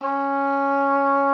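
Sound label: C#4, oboe d'amore; Xsample VOL-7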